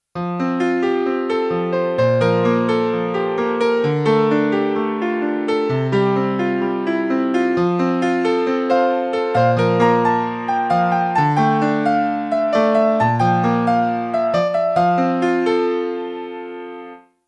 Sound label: Original Piano